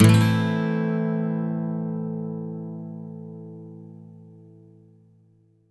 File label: MIN SWEEP A, Electric & Acoustic Guitar; USB Soundscan vol.04; AKAI